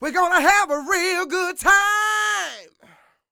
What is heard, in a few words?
SING, Vocal Planet Blues Soul BLUESY